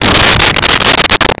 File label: impact meteor fall